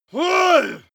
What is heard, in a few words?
male groan strain